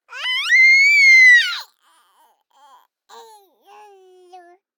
BABIES SHOUT Girl 12 months High Dynamic; Baby Boom

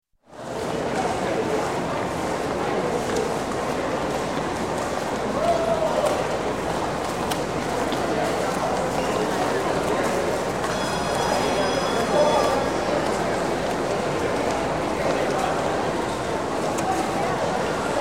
Shopping Mall Medium, Indoor General Ambience; Store; Sound Ideas 2000 Series

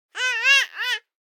BABIES SHOUT Girl 12 months Short; Baby Boom

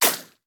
Bullet Ricochet; Sound Ideas; Guns

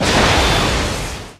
Rocket Launcher Shot; SFX Kit; Weapons

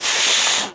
Inflate Large Orb; SFX Kit; Foley - Misc

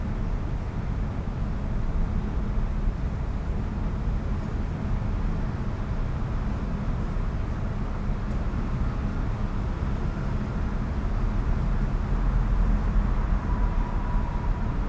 Urban din night traffic rumble; urban and suburban; boom library

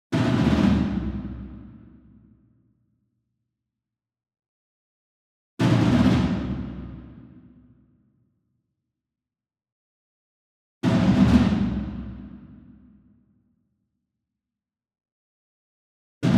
ENSEMBLE DRUM Concert Toms Roll Far; boom library; Cinematic Strikes Construction Kit

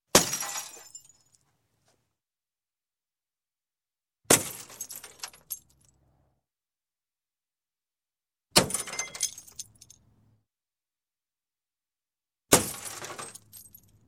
Glass, Smash Smashing Automotive Headlight; Crash; Sound Ideas 2000 Series

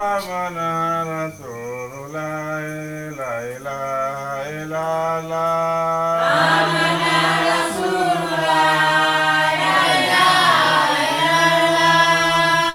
africa chant benin; best service Blue Box

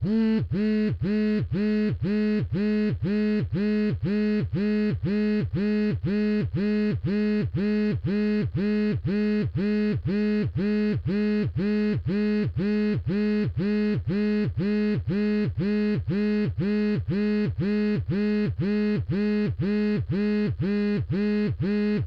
phone vib quick; devices accessories utilities; NS Studios - ultra sound collection

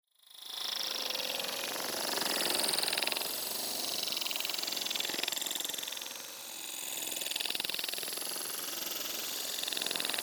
TEXTURE Micro Flow; boom library; Neon

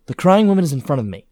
crying front; blindside

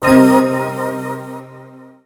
Pickup Magic; SFX Kit; Pickups

